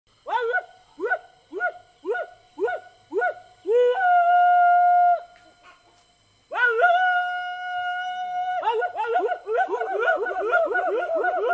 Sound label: Bisorio House Building